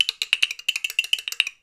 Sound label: Wood, Percussive FX; musicradar; cartoon caper